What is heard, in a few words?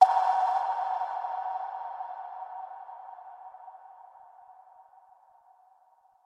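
sound impulse radar ping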